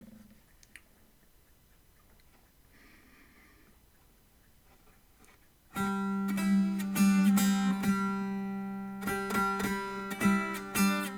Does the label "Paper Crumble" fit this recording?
No